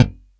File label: CHOP G1, bass; AKAI S6000 CD-ROM - Ultimate